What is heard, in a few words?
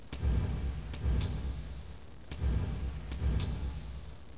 load; Bible Fun Pack; BIBLELND